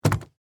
Suitcase Latch, Foley, Suitcase, Latch, Open, Hard, Shell; Latch Sound Effects; Latches (Sound Effects:Objects); BlastWave